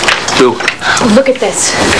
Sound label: boo